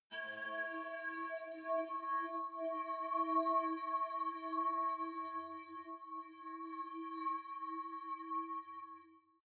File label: metal high brass stick singing; maschinen; soundpool